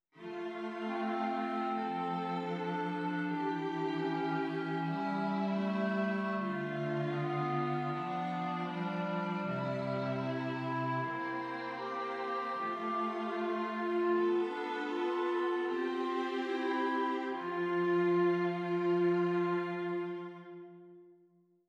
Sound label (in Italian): esercizi di contrappunto semplice A tre voci; Ronge